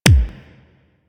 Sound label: SMG Punch, weapons; Protea